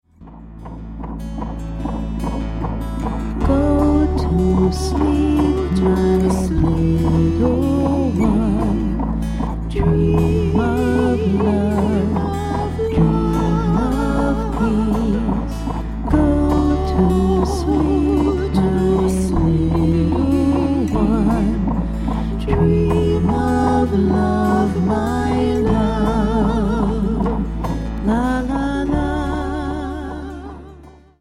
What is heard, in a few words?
lullaby example